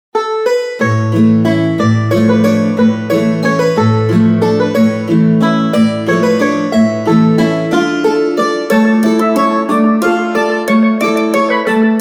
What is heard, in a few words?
bgm acoustic; maou; アイデア力音楽; 魔王魂アコースティック18